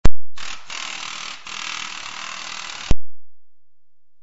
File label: air chisel